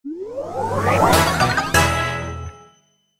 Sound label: Super Stretch Appear